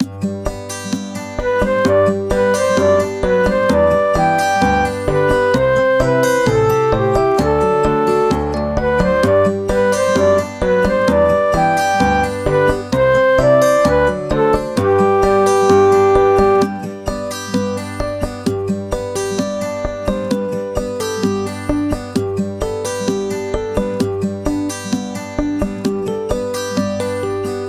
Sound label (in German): Fadeout Bongo Gitarre Zweitstimme, 130; Mia Podcast